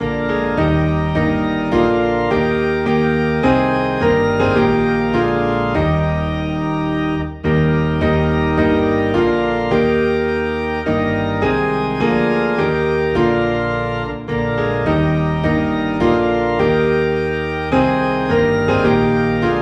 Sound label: Piano and Organ LOW in E Flat; Oh Worship the King